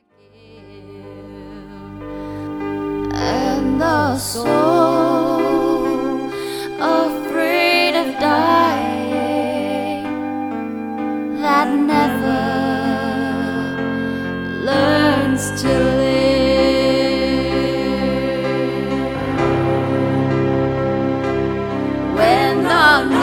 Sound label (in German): Gesang